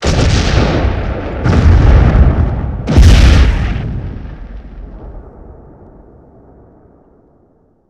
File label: Successive Explosions